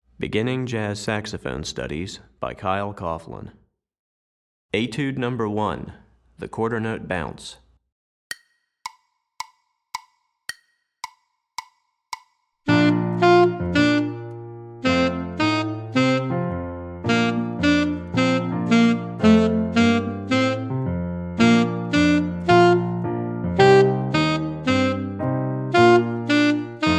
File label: Alto Etude, Saxophone